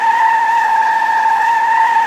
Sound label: drift; sonic